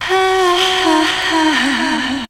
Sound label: SEX; Zero G; Man Machine